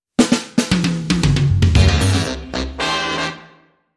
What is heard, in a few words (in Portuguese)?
Banda Ao Vivo